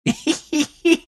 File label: laugh; dota; gyrocopter